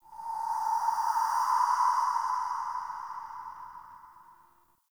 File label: Spirit Gust; Ambience; Dungeons; Under City